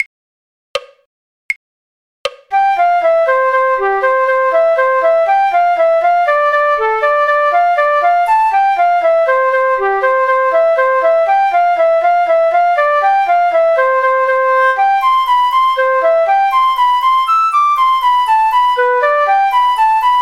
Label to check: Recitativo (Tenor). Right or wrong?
Wrong